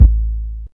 small reverb rap kickdrum a key, Royality free kick sample tuned to the A note; kick drum samples